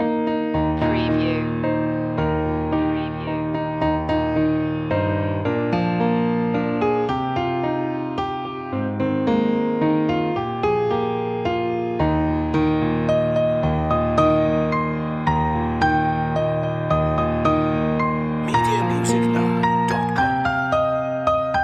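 the holly and the ivy piano loop, Christmas Traditional; media music now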